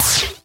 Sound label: Claps & Snares